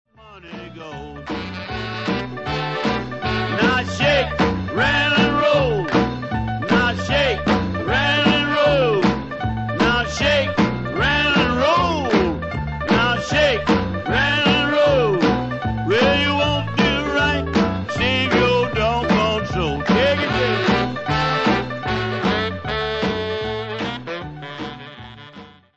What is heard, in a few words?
Shake R&B